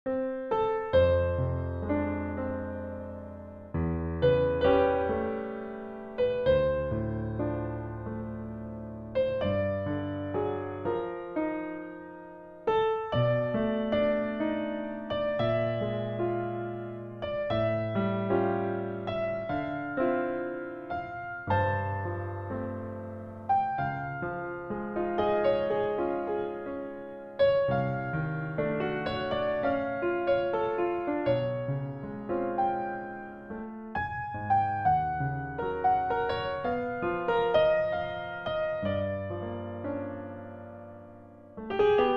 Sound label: relatively jazz; music transformer